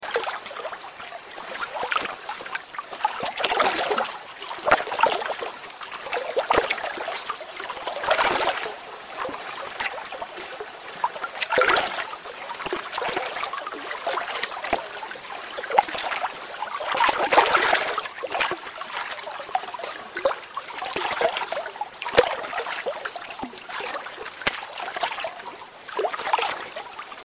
waves lake light waves on shore

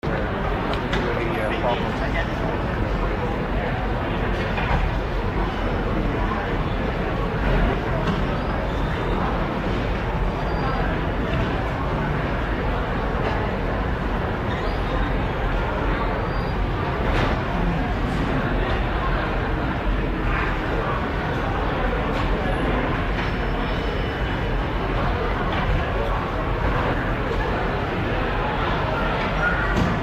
atmo airport busy crowd

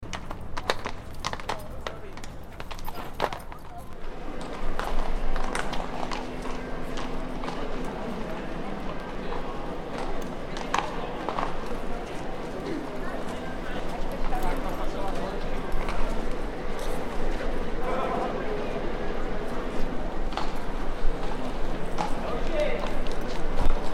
rustic old city

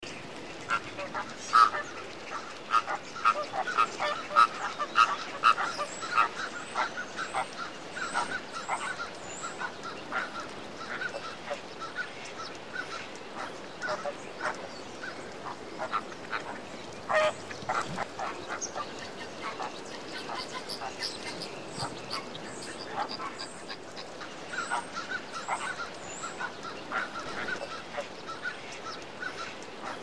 birds wood ducks

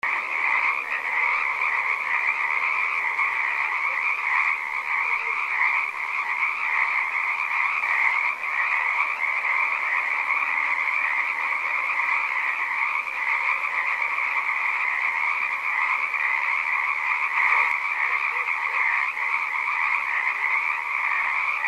frogs tree frog chorus

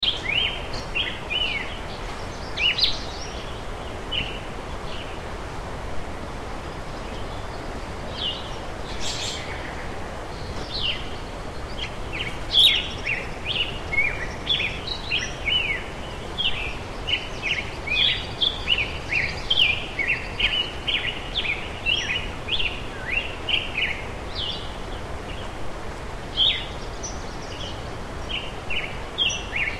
atmo arizona sedona call of the canyon birds

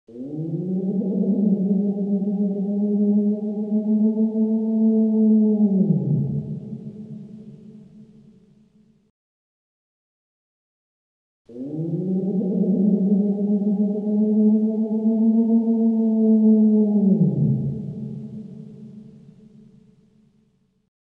dark spooky set

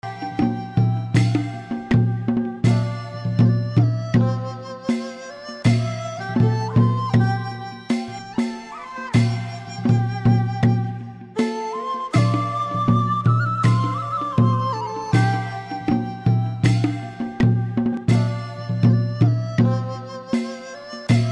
atmo asia instruments

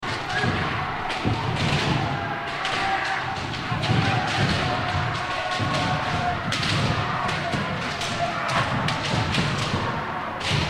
asia kendo training

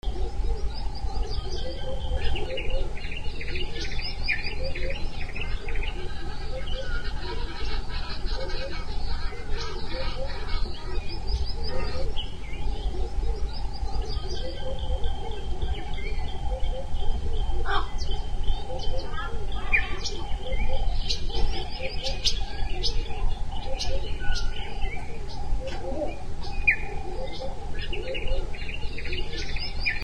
atmo birds soft tropical bird calls

